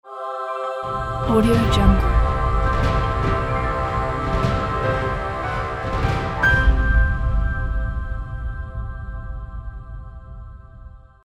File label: epic choir logo